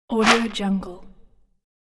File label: toy plastic building bricks